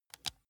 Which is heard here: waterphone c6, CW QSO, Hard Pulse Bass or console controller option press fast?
console controller option press fast